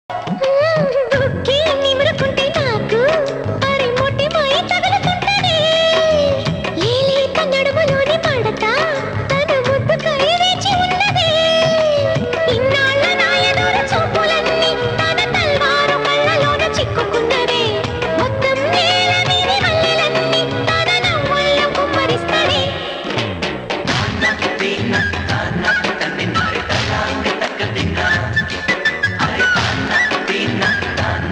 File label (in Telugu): telugu songs music love; khadgam